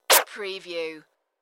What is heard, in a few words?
DJ Scratch Effect